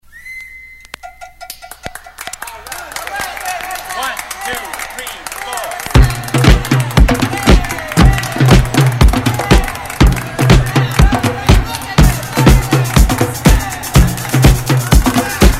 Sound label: DISCO Drum Band Jerky Rythm